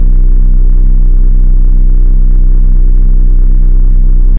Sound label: Lightsaber Idle; car simulator 2